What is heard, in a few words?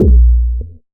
Kick